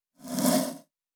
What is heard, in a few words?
Settings Appear Wood Slide